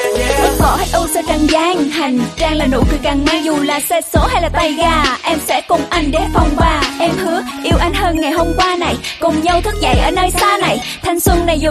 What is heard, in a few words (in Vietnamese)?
Nhac Chuong Rap